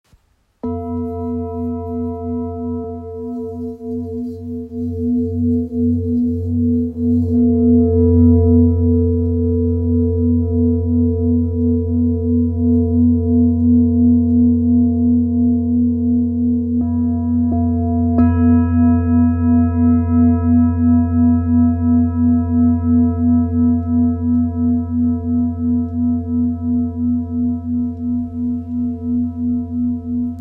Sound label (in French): Bol ramgandi fa